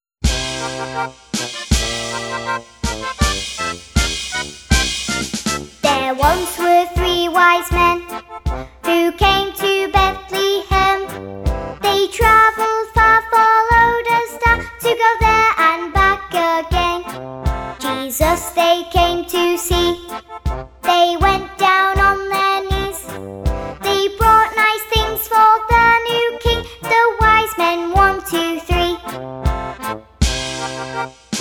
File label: there once were three wise men vocals